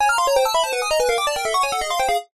multimedia game retro musical descend negative fail damage crash